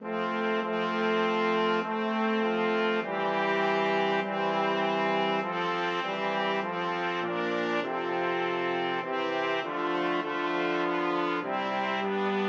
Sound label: o come BRASS, brass quartet